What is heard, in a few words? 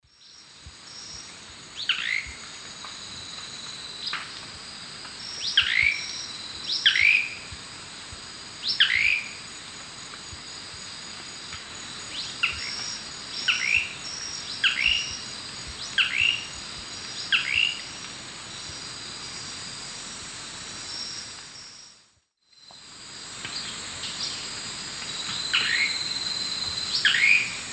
Lepidothrix coronata, song; S. America; Eastern Lowlands